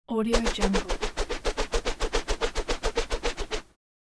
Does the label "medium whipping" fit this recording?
Yes